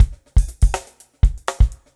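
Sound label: 122 bpm Funky Drums; R&B Funk Soul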